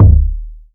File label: subtle reverb bass drum D# key; kick drum; D sharp key